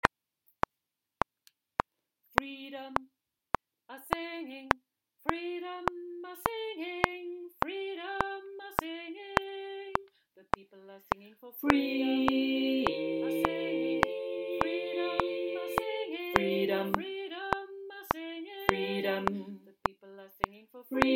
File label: tenor